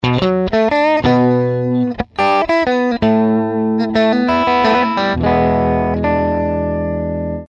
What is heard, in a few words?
Clean Twin